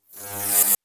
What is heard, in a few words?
Electric dis hum